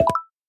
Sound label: ready